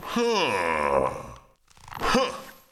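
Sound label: Metal Knight peace idle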